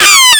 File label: claw strike